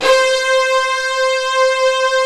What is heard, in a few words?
STRINGZ C4, D50; E-MU Producer Series Vol. 2 – More Studio Essentials; Keyboards; D50 Combo